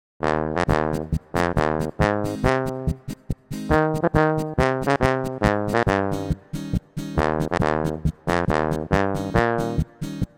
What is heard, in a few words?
King Diskant